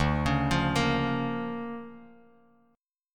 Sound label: D Minor Major 7th Sharp 5th down Bass Standard, chord; DmM7#5 arpeggiated; JGuitar